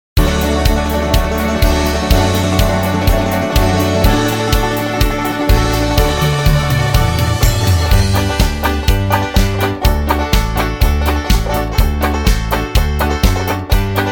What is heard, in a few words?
Scottish Gospel Medley No Backing Vocals, backing tracks